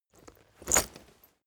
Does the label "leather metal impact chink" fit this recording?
Yes